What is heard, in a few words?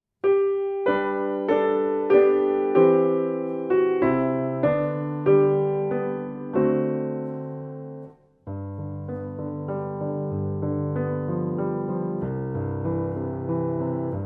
Traditional Amazing Grace 95 BPM, violin